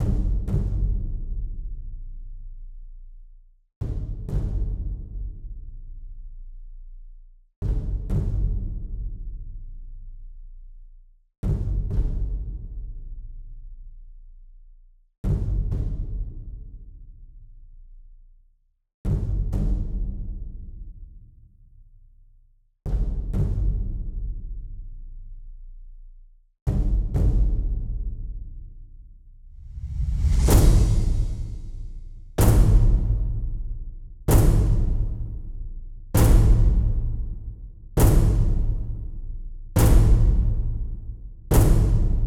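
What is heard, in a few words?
Percussion; ProScores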